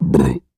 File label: Deep Burp; burping